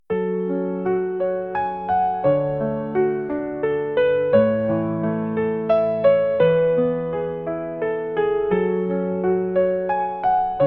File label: Piano Intro